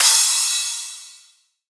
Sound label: Crash; Cymatics; House Starter Pack; Cymbals